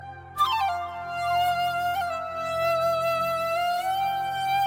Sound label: flute text; alternative